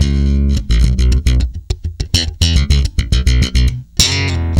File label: C#, tweaky, bass; Spectrasonics; Bass Legends